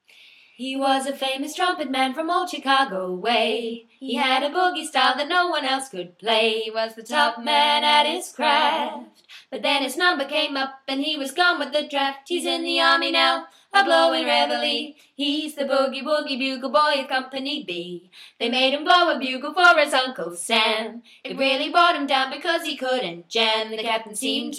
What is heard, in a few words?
A Cappella; Olivia Tennet; Rose Mciver